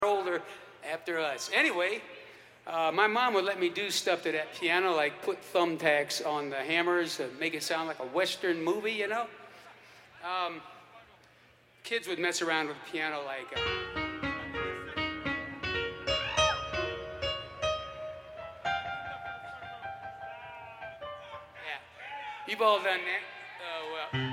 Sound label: Piano Moment